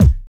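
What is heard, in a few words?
bass drum, Burning Grunge Hip Hop; USB Soundscan vol.34; AKAI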